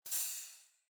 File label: museum door